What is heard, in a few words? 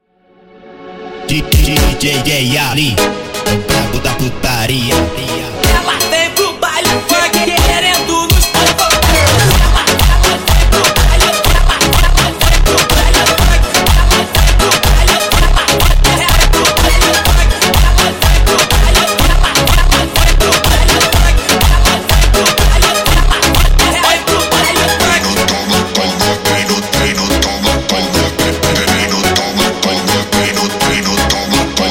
Montagem sped Up